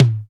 Acoustic Tom Drum Sound A Key, tom drum tuned to the A note